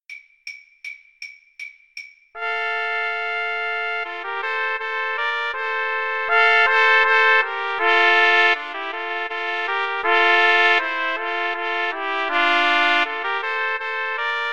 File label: slavonic, Trumpet Trio